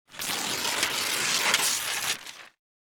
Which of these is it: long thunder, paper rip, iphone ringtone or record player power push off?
paper rip